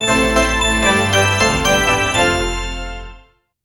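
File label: barrel organ victory jingle, carnival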